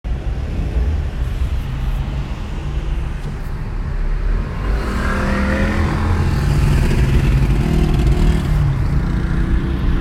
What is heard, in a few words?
Driving in Tehran